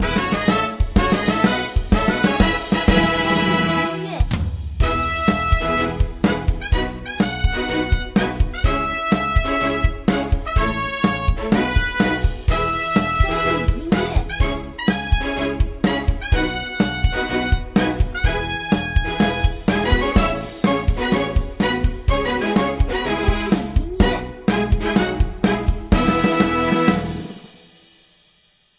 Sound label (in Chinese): 战斗胜利